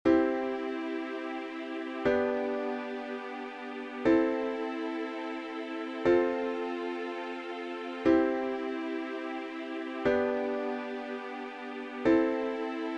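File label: Chord Progression I V vi IV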